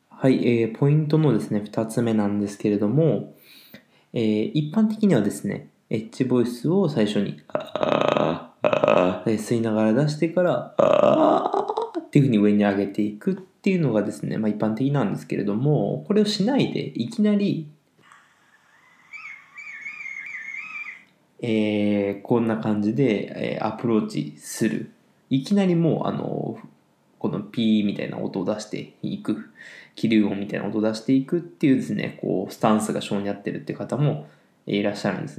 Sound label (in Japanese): 息を吸って裏声だそう